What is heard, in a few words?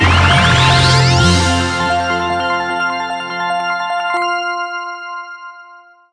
large Win; space wars